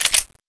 slide back, weapons; paranoia

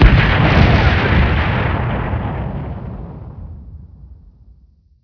mortar hit

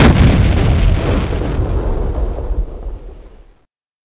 explode

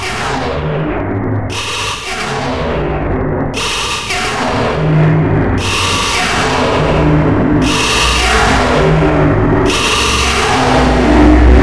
portal

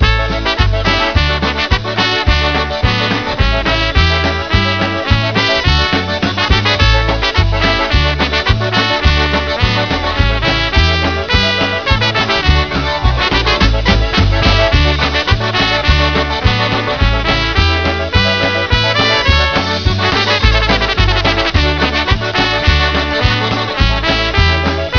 polka; ns wubbit b4

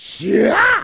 kid pain; buu

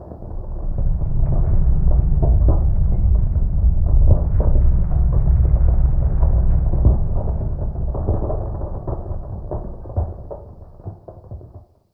desert rumble

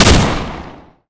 Field gun

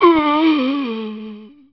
zombie idle, male

